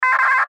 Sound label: Radio Morse Code; Weak Signal Letter K; Warfare; Blastwave